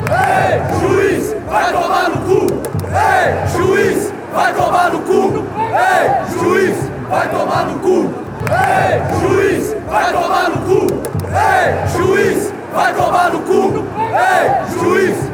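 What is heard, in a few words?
fanchants, football chants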